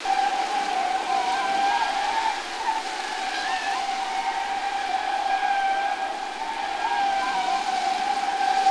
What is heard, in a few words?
SKID OIL; SIMUZENSOUND